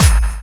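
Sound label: snare drum bass drum, Dance Mega Drums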